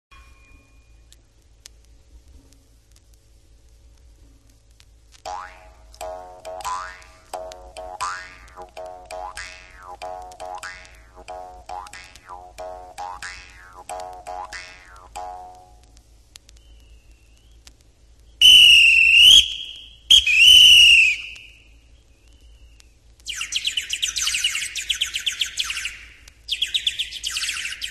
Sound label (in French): Guimbarde sifflet appeau rossignol jazzo flute crecelle sonnerie reveil Percussions